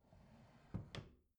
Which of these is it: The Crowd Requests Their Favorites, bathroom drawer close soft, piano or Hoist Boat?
bathroom drawer close soft